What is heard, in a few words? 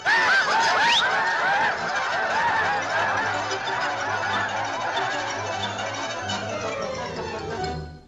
Island Native - Screaming